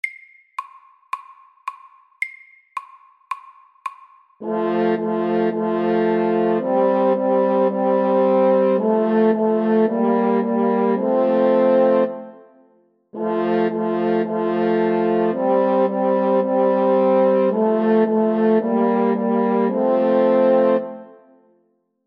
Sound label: First fanfare MIDDELS